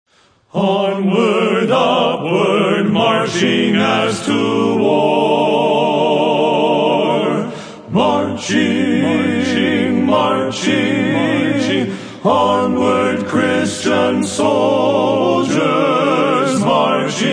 Men's Quartet

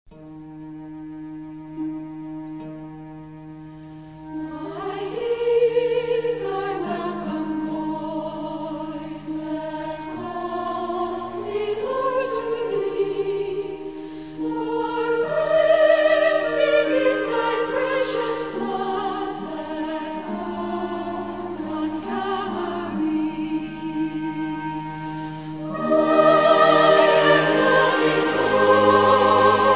Orchestra Amen Choir, Hymns

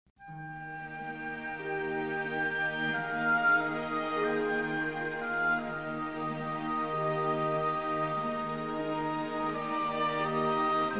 Orchestra and Choir, Hymns

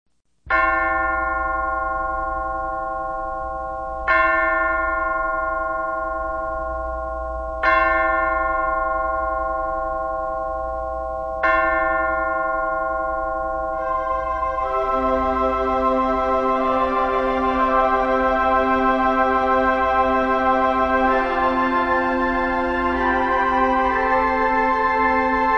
Cathedral Organ And Chimes; Sweet Hour Of Prayer

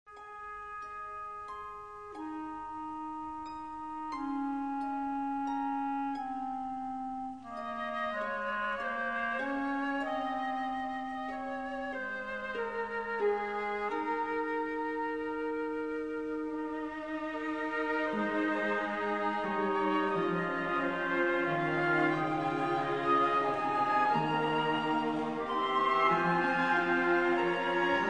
Orchestra and Choir, Hymns

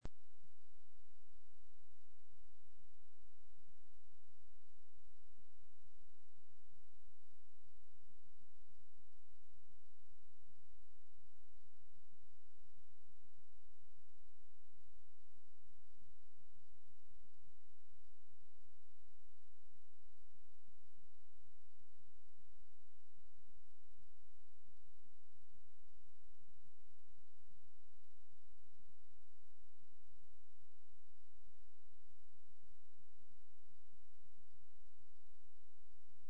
Orchestra and Choir

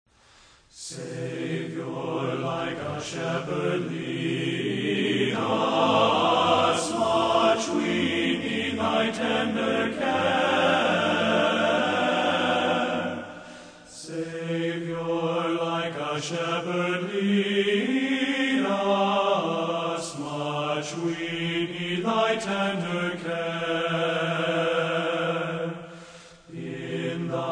Men's Chorus

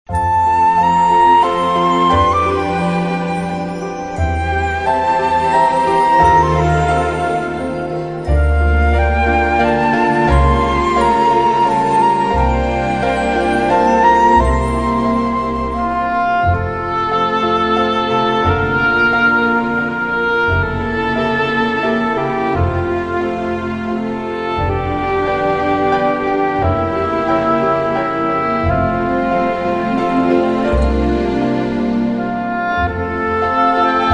Orchestra

